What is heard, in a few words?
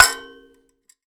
Finger Hit, Prepared Kit; Battery 3 Sample Kits